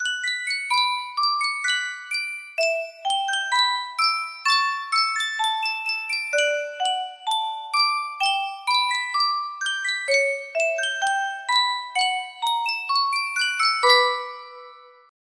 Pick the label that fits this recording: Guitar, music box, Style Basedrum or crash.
music box